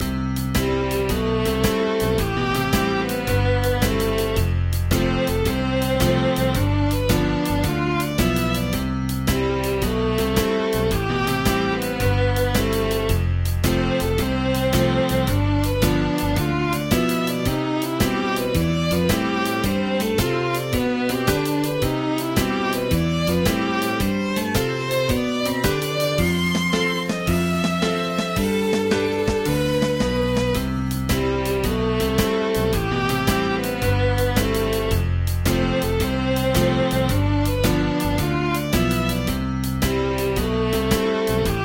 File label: Western Swing